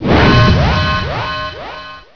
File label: nuke; terran